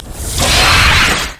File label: spider smash; LoL